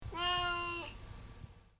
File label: Miaow